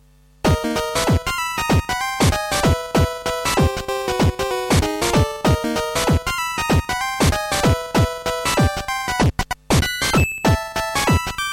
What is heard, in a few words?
spooky jazz; weeklybeats-2016